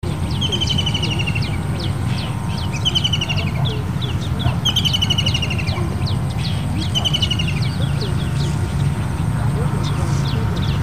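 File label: ruddy kingfisher voice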